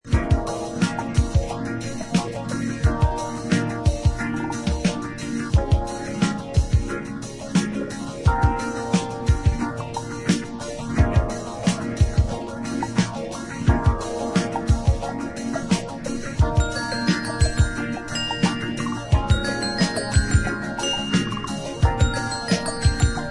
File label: esp (drum)